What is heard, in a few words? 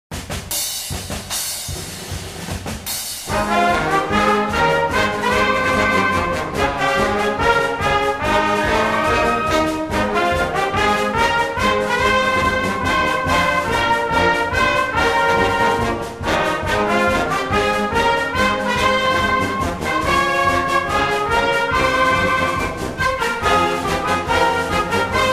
Fight Song; Hanover